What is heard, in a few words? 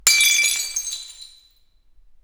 Piece of Glass Impact; SFX; IRL Recorded